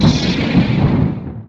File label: Quake; wipeout